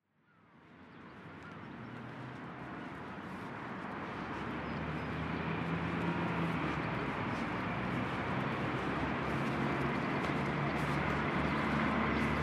warning siren test following town hall bell striking 11 o clock; as happens every friday